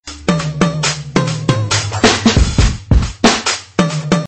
Hip-hop Gitar Tune

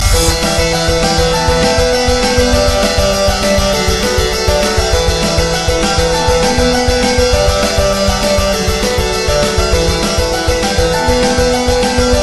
Energetic Guitar

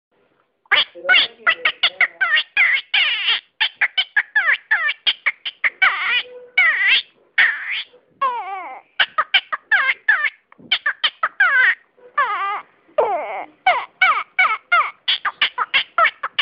Child Effect

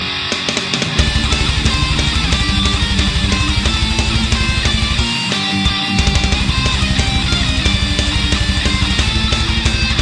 Tetris Metal

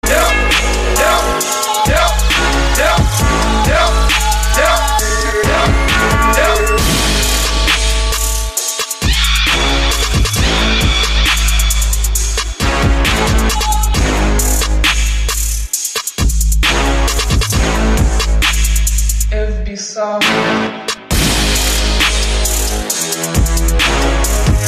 maori haka

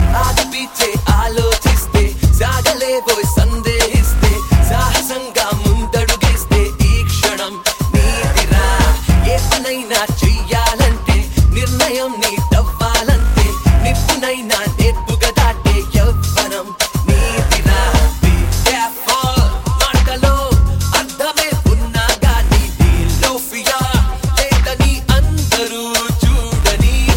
Heart Beat

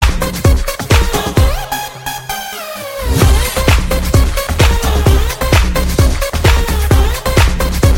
Dance Mix Sms

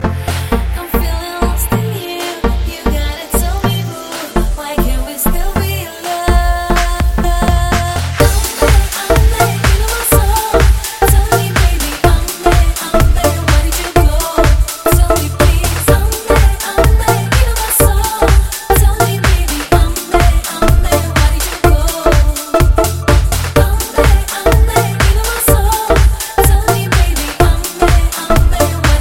Cool Ringtone

